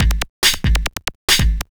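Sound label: DRUMLOOP ELECTRO 140; Techno2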